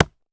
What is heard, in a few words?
Industry Tool Sledge Hammer Hit On Tree